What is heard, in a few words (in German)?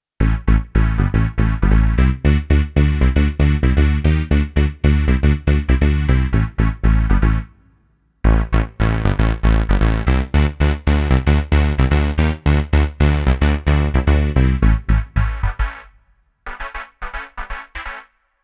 Solosound mit Filter